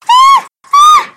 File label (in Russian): izmuchennij pisk